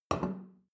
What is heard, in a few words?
plate place table soft short, gastronomie; soundpool